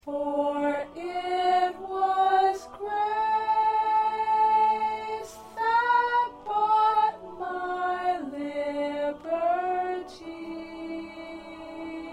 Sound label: 1st Soprano